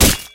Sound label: trap; Arcane Quest; android game